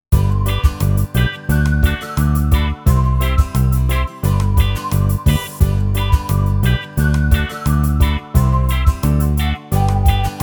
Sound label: Karaoke